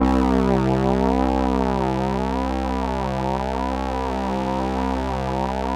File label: LAMBDA, String Machines